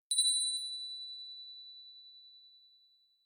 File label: Tibetan Bell Ringing, Single bell ring from a small buddha bell